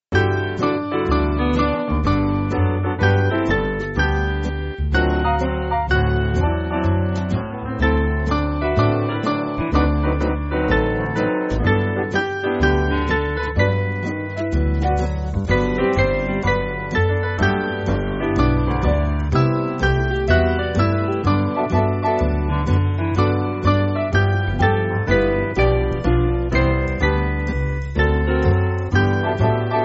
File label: Jesus Bids Us Shine, band